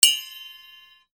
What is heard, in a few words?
BELLS